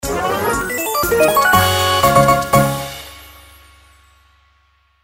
games won; Fondant Favourites StarTrail; Eyecon

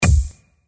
logo land; Hot Off The Press; Eyecon

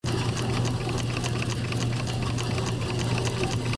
reels spinning; Fondant Favourites StarTrail; Eyecon

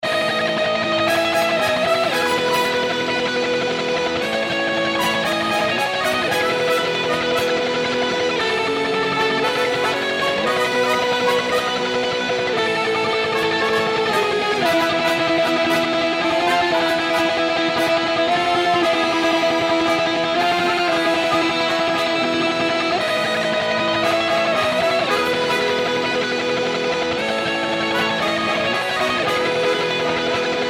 bg mega win track; Lucha Rumble

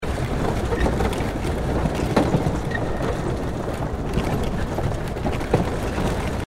Motion; Dynamite Digger Eureka

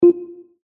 ui click up; Lucky's Fish and Chips

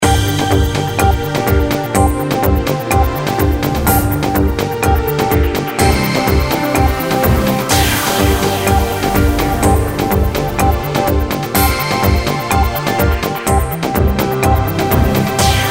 free games music; Hot Off The Press

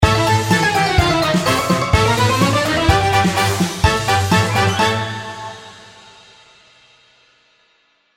win super mega; Beat the Bobbies 2

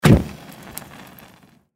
torch Light; tiki-beats; eyecon

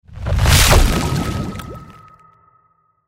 trident reveal; Champions of Poseidon

